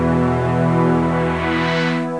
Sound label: lose